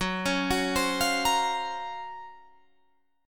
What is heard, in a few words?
Gb Major Sharp 11th, Guitar Drop D